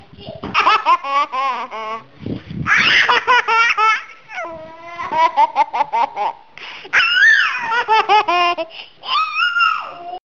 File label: laughter baby